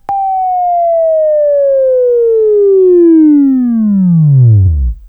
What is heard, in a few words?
WAV Right, Sweeping; Sweeping Training program